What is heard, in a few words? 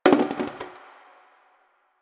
Janitor Cart Plastic Bottle Drop; Warframe; Ambience; Nineteen Ninety Nine